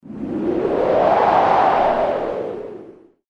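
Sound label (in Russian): еще сдувает; Звук ветра; Звук ветра для перехода в видео или для монтажного перехода в аудио монтаже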